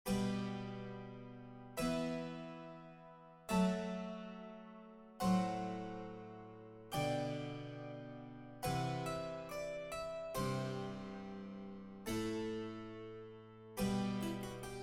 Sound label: The Sun Dappled Forest (for solo harpsichord) Chaconne and Fantasia